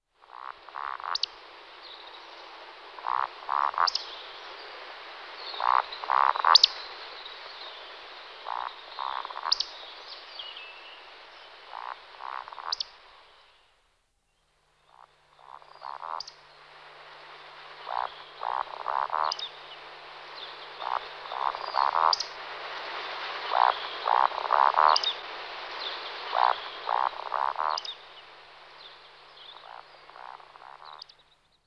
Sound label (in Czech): Sluka lesni Scolopax rusticola